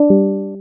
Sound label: user leave